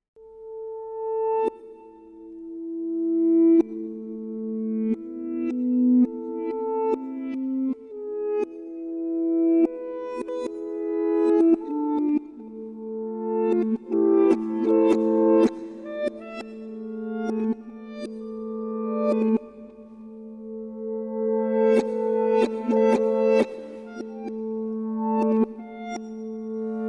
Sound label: Ukulele reverse; Vem Kan Segla Forutan Vind